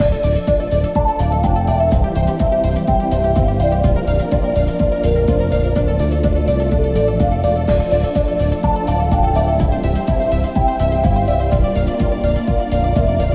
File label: loop Turtle; Garfield dmx minigame